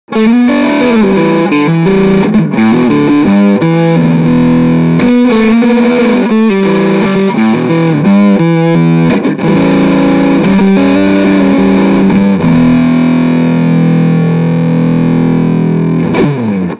Loose High Fuzz Medium Tone Bridge

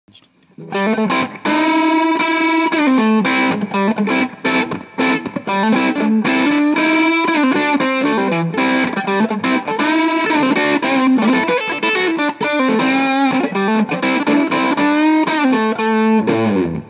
Moderate Tight

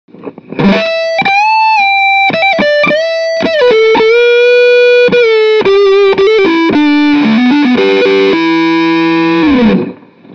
Heavy

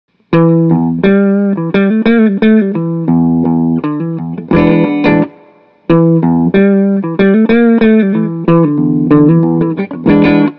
Clean

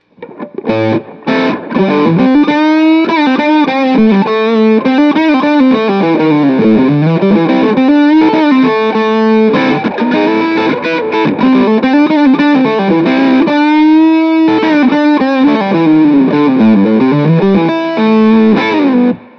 Loose High Fuzz Medium Tone Neck

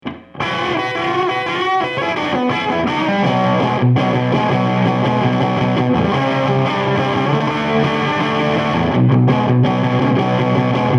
moderate gain

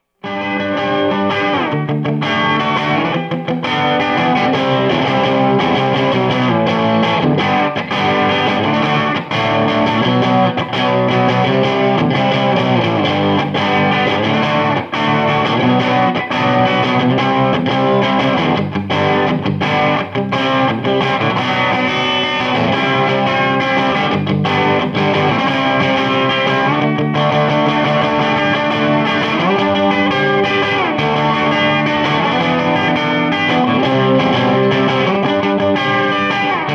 edge moderate gain

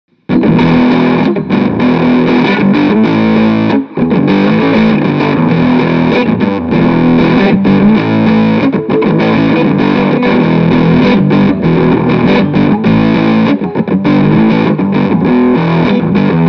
Heavy Tight

